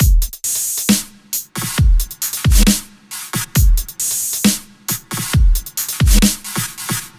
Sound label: Dubstep Beat